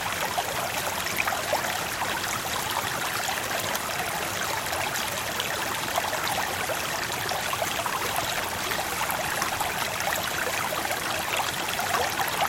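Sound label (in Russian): чистый ручеек в густом лесу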